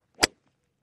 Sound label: Golf Ball Hit W Iron